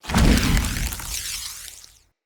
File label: Bow Equip; Weapons; Player; Bows; Fey Thorn Bow